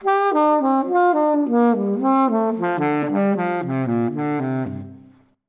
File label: Jazz Saxophone